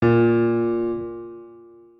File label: piano Bb2